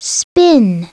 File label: spin; Princess and the Pea; SoftKey